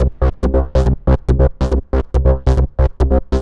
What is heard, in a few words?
Trance Bassloop 140bpm, Bass Arps